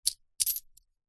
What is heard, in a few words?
foley money coins small x4 set down into hand stack; zapsplat